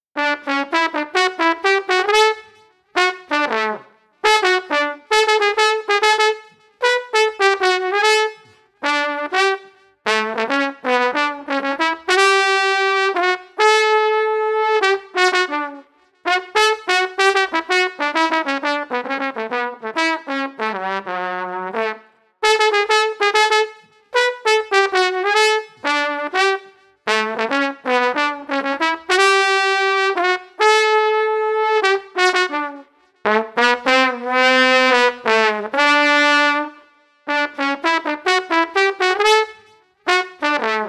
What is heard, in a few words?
Street Trumpet Possitive 97